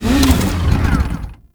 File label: Motorcycle, Dismount Moving; Warframe; Vehicles; Motorcycle Ninety Nine